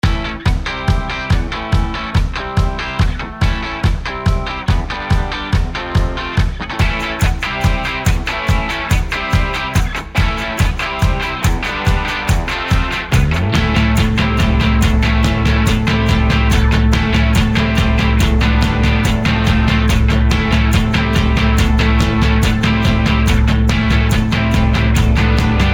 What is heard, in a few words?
Indie Action